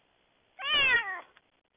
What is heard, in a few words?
cat meow